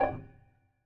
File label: Prepared Piano